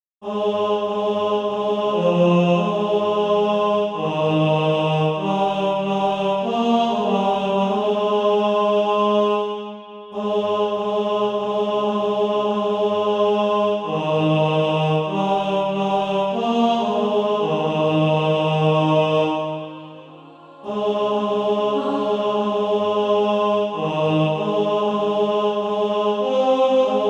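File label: tenor, Practice Music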